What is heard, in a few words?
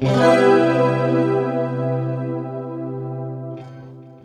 guit strum (G#); Sonic Foundry (Sony Creative Software); Ugly Remnants VOL-2